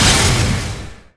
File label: explo, shaman skill; noejeon